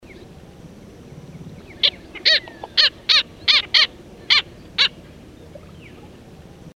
Black skimmer; پرنده آب شکاف سیاه

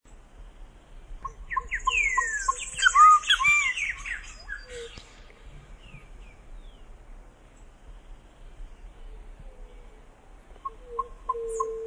Solitary cacique

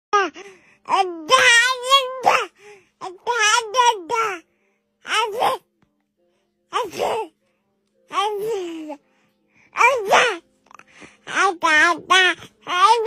Baby without words; صدای کودک بدون گفتن کلمه ای

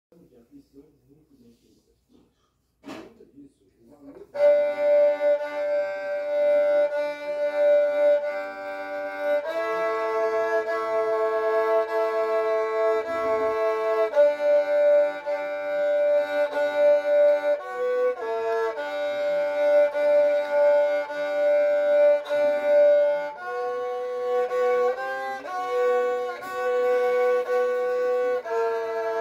rebec

